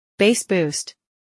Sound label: BassBoost; en US